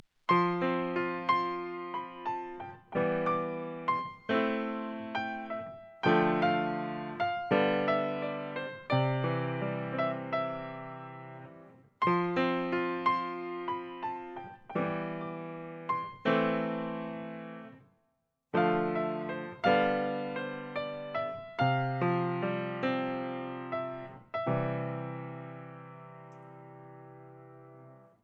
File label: part piano